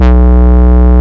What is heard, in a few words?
Root B, Ultimate Bass; Vengeance Samples